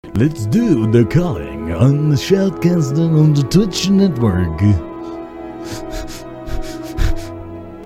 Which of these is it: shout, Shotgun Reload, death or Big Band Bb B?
shout